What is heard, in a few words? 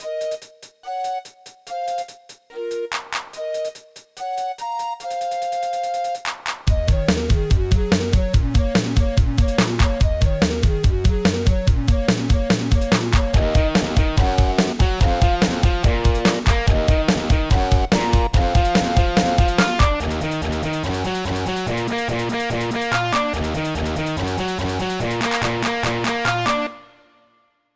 ringtone house